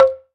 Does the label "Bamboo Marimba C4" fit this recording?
Yes